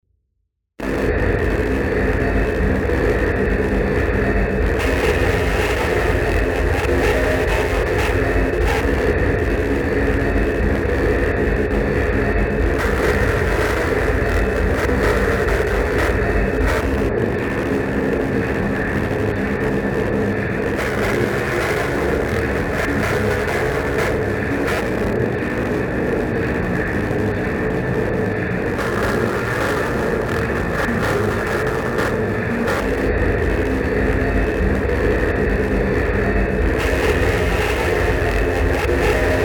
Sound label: hover, noise